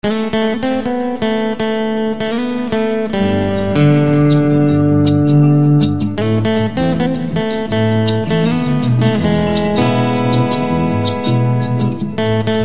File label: Guitar Instrumental Ringtone